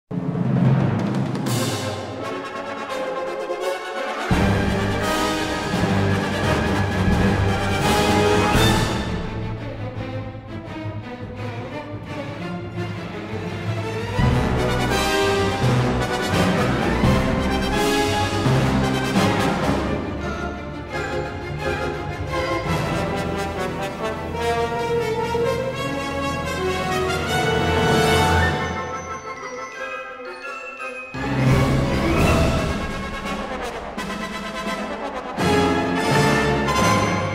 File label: Combat Loop